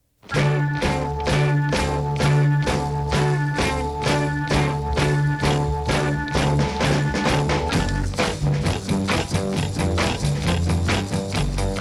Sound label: instrumental